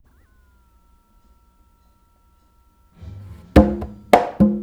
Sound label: conga